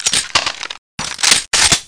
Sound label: mp5 reload; Soldat